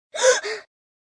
Gasp In And Out, Gasp, Breath, 1 child, catching breath, scared, human voice, kid; Gasping; Voice Box